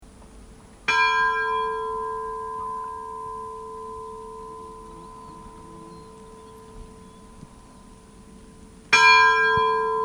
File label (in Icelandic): hrunakirkja likhringing, kirkjuklukkur; Hrunakirkja var reist á árunum 1865-1866